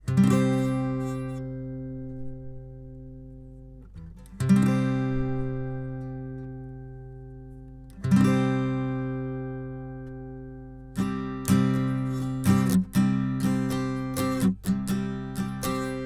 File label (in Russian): Akkord Cm 3 lad